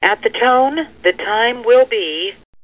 TONE

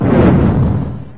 Large Missile Explosion; 2D Shooters; Retro Gamer 17; galacticgladiator

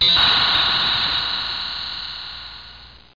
Wierd

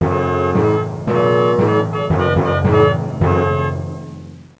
timpani; PUPPIES

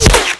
weapon impact flesh; Spearhead Demo; MacFormat 2003 June

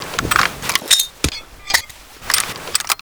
grenade launch reload, weapons foley; Spearhead Demo; MacFormat 2003 June

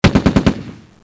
browning, guns; KABOOM! - Wild and Wacky Sound Software

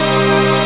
chord

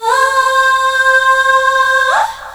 "oohhh"

